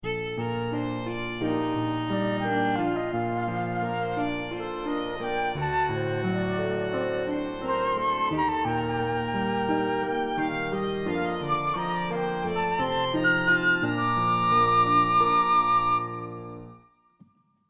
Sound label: JRPG Ditty